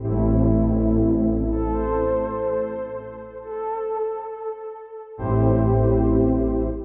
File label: techno pad 140; Techno2; Keys